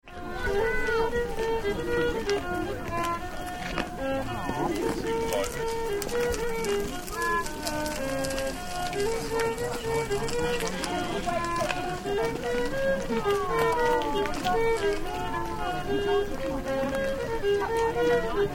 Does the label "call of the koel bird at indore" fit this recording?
No